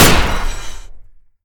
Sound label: shoot; npc; repeater